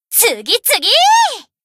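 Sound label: attack; asuna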